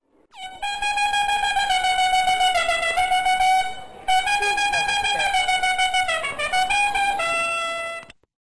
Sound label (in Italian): tromba